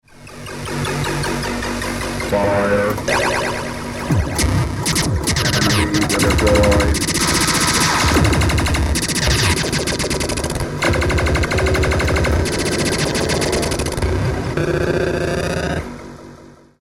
firepower

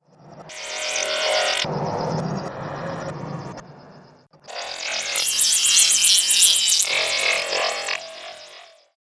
ping ring

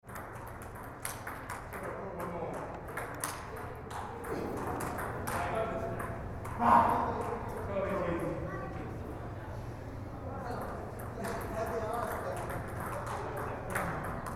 ping pong

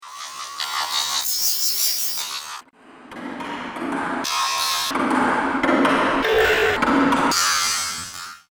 ping ring

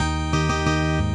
ghosts goblins

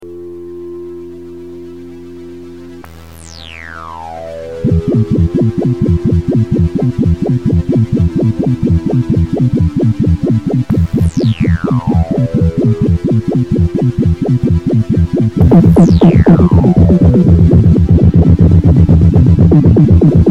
Wobble

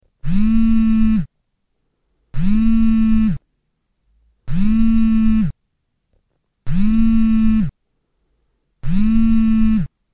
vibrate

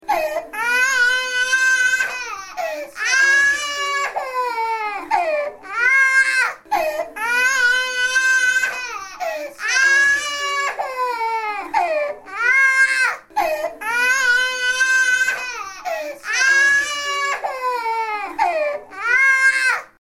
crying

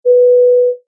airplane

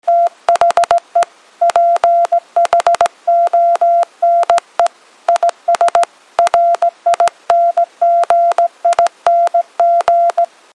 morse faster

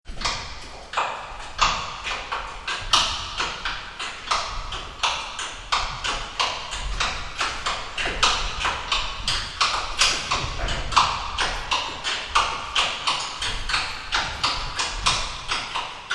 horse hoofs

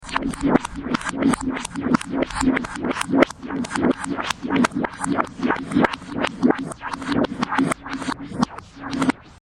ping ring

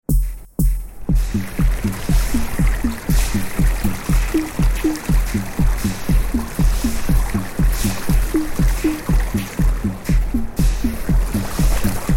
water stream

